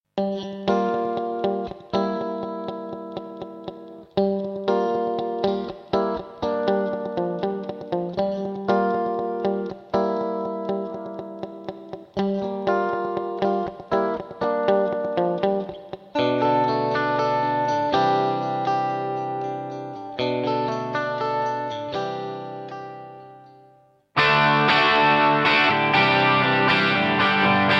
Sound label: Guitar Roland SRV 330 70 Rich Plate; Vintage Digital